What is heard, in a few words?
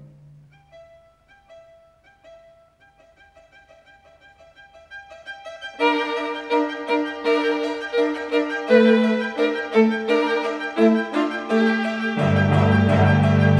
String Quartet, F minor, Humoresque. Allegro; chandos